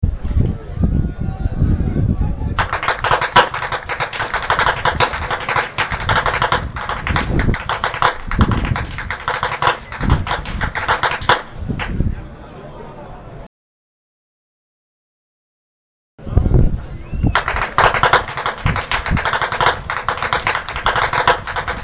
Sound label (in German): schnalzen